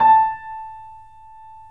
3D Pianos Yamaha C7; E-MU Producer Series Vol. 5

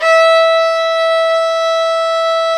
STR XVIOLA, Str Quartet; Viola&Violn

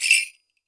skranz, WORLD PERCUSSION; Best Service ProSamples vol.52 - World Instruments 2; MIXED PERCUSSION

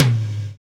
tom, Roland; Club-50 - Foundations Roland; Ext.Snare 3

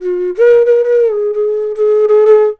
FLUTE D, Indian Traditions

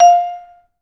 VB MUTE F4; Best Service; Peter Siedlaczek's Advanced Orchestra

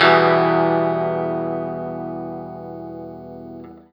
PRS CHRD MIN, Guitar; 90 s Sample CDs; Quantum Leap - Guitar & Bass

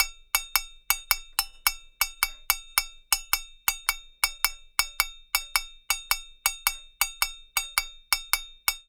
Hand Bell, African; Sonic Foundry (Sony Creative Software); Continental Drift Aftershocks

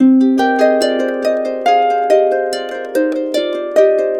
140, Complete Harps; USB Soundscan vol.62; AKAI; WATER 140

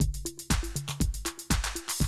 120, Classic Drum Loops

